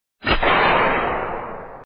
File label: whip; vapesquared